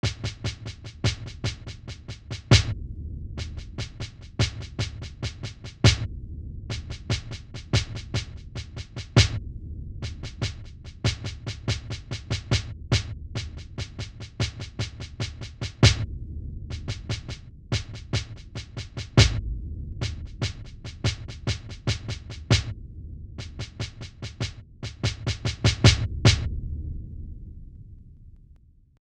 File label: drum track snare, Smooth RnB, 072 G#min